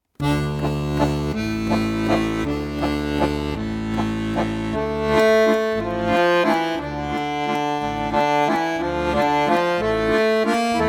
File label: Jazz suite